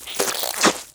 big, mob slime

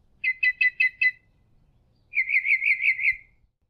squeek; matmos hl

